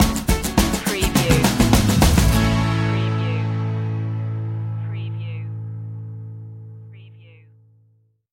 adventure plus stinger

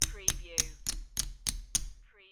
Poker Chips Counting Chips

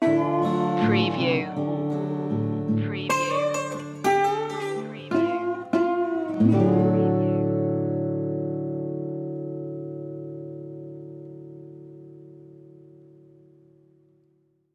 soul broke blues

